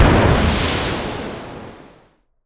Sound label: ship miss small effect; Hearts of Iron IV Death or Dishonor